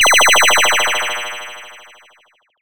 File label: Retro Weird